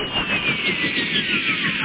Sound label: Hyper Space; F1 Licenseware - Volume 1